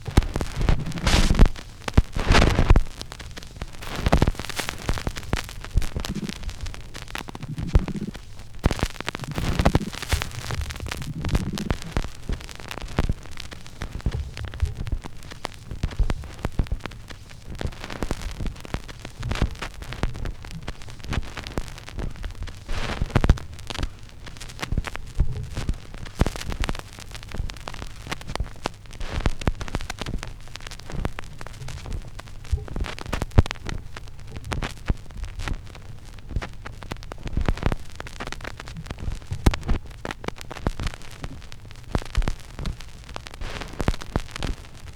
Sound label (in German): schallplattengeraeusche, Mix